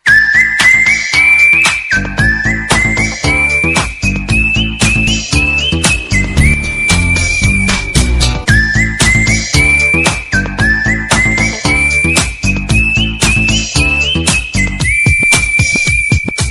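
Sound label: whistle ringtone; gharshana movie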